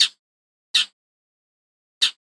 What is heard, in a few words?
shrike cry